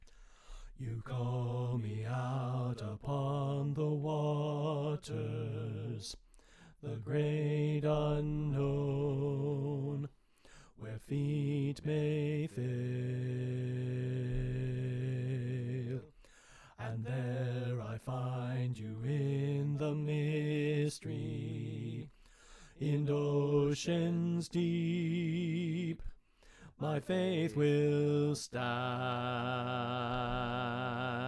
Soprano Left

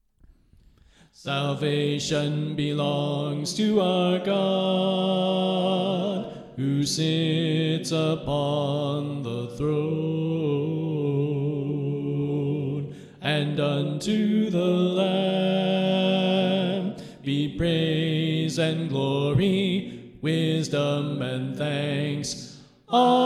Soprano Left

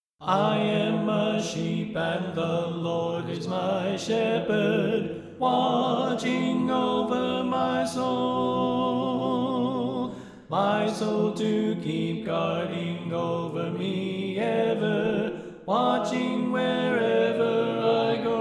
Tenor Left

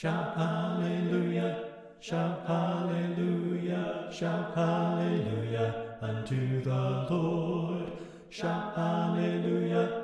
Bass Left

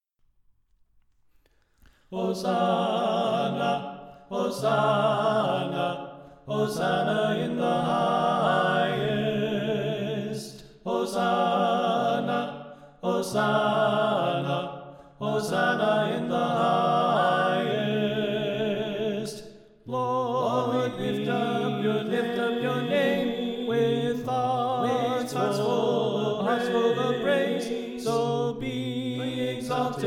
Tenor Left

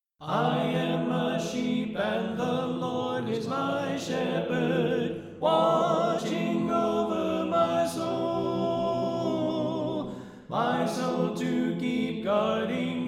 Alto Left

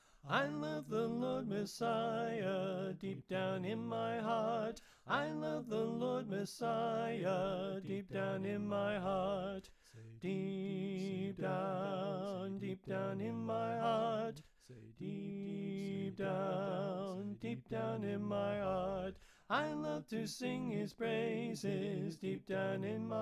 Tenor Left

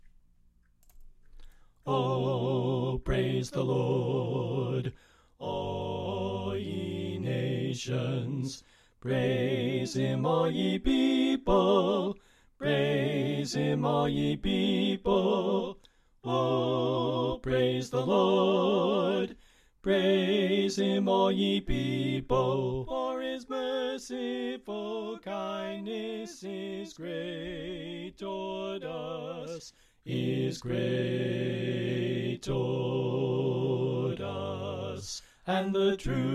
Bass Left

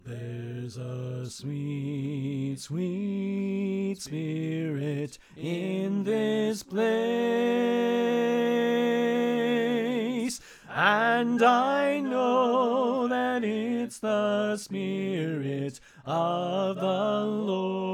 Soprano Left; Pennsylvania Groups; GQ Camp Reunion

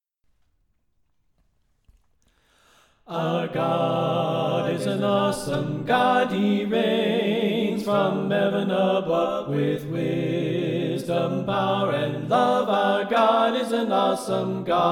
Soprano Left

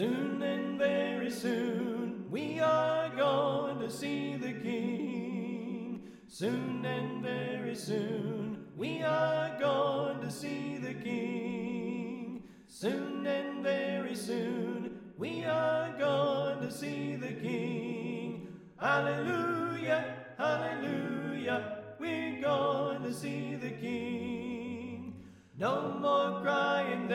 Tenor Left

